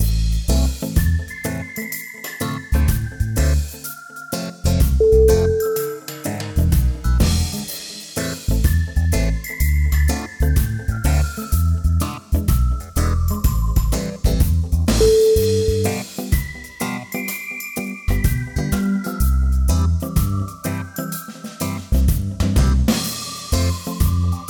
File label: moonlight reggae